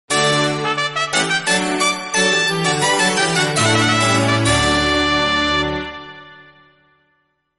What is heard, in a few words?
Victory